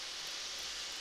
Background Noise

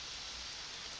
Background Noise

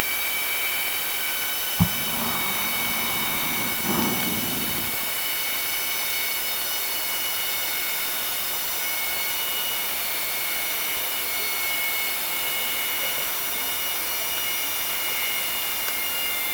Orca sound Night Passby

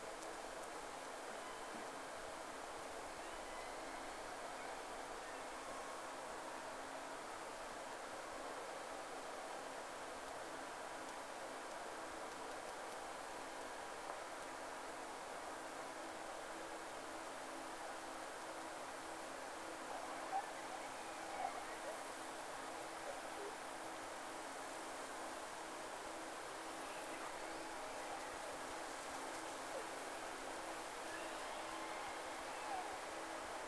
Whales; SeaSnake

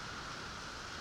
Background Noise

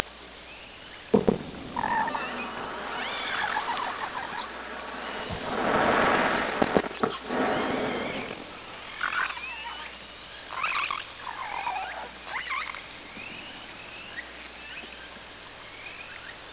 Orca sound Night Passby

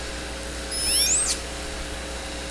Southern Resident Orca; Lime Kiln

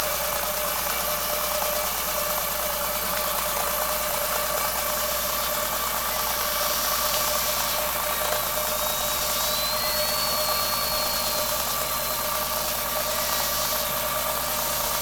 Hydrophones, orcasound

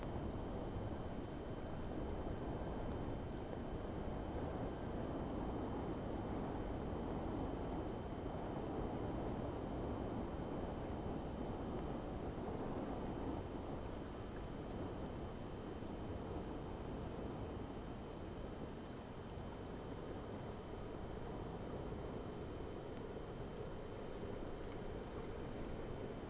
Whales; SeaSnake

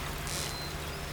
buzz test

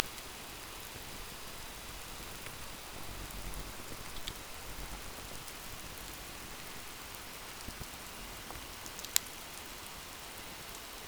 hydrophones, lime kiln

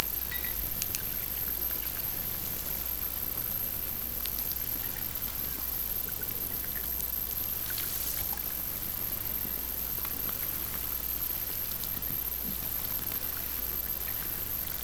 Hydrophones, orcasound

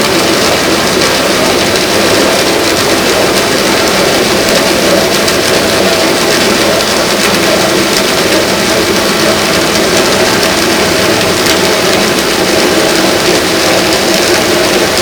Hydrophones, orcasound

